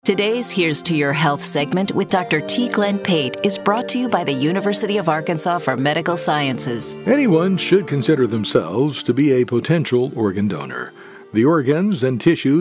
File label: organ